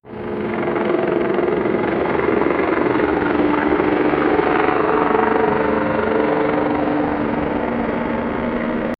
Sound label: helicopter flying in air; aerial